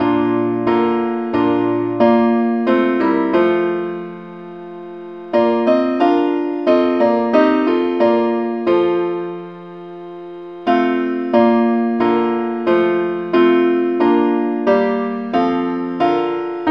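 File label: parts only, key B flat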